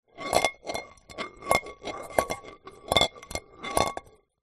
food eggs hard boiled shells on x2 roll around metal pan; zapsplat